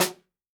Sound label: snare drum, jungle snare chromatic